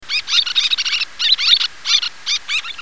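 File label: little tern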